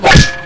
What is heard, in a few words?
PANG; Rantmedia collection